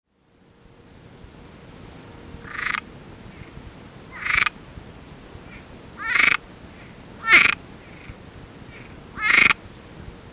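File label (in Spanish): Ejemplo de sonido, Anfibios de Cuba; anfibios que habitan en la i; Guías sonoras; Primera guía sonora publicada sobre los anfibios que habitan en la i; Fonoteca Zoológica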